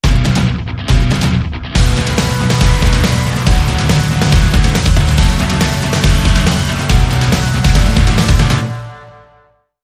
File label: Sting